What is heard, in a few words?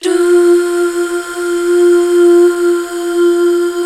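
DUH, Female; BACK. DUUHH; HAL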